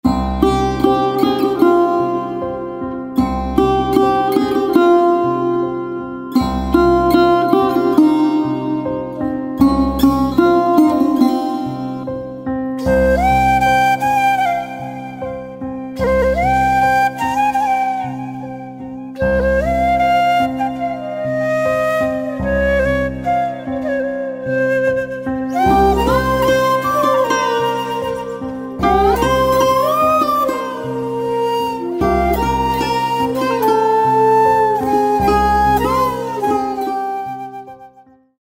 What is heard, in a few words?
Veena with Flute